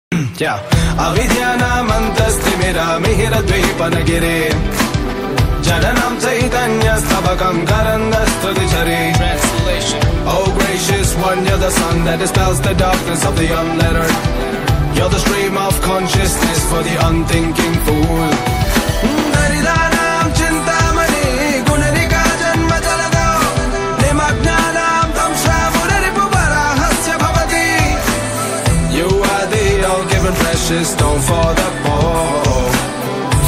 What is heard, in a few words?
hip hop version bgm